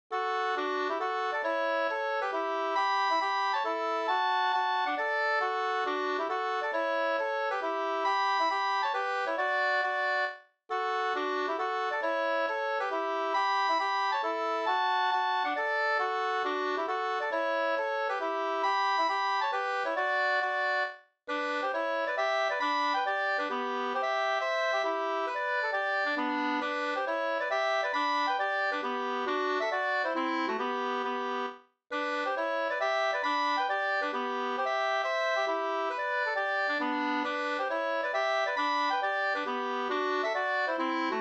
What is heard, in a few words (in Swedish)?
mazurka, 2st